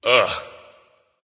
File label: "ugh"; smite; voicepack; ullr